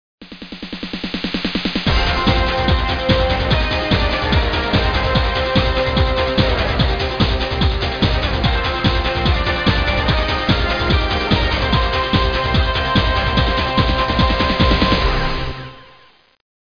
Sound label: victory